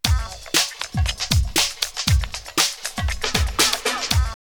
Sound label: wow wow 118, drum loops, old school; X-Static Goldmine